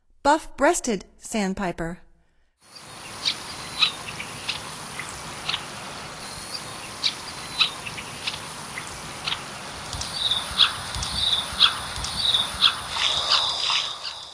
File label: Buff-breasted Sandpiper, Songs of Birds of North America; Stokes